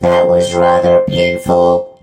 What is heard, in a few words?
hurt; rico